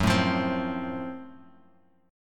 Gb Minor Major 7th Flat 5th Gb Guitar Open G; 4,2,3,5,x,x; down; chord shape

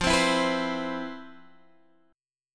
C Major 7th, F, guitar chord; Cmaj7/F; strummed

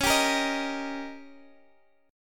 D sharp Major C sharp, chord; D#/C# strummed; JGuitar

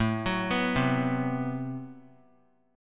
A Minor 6th Add 9th A, Chord; Am6add9 arpeggiated; JGuitar

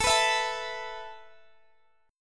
A Suspended 2nd down Guitar DADGAD

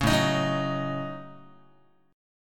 A 6th Flat 5th A Guitar, chord; A6b5; strummed; JGuitar

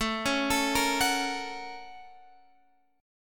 Bb Minor Major 7th Sharp 5th

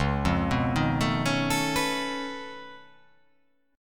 C sharp Augmented 9th C sharp down, chord

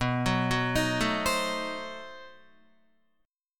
B 7th Flat 9th B Guitar; B7b9 arpeggiated; JGuitar

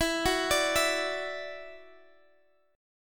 E 7th Suspended 2nd, down, Guitar, Drop D

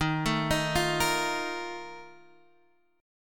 Bb Major 7th Suspended 4th, Eb, chord

